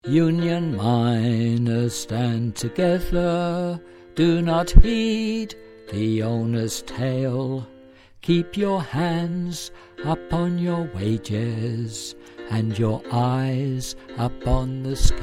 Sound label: Chorus Bass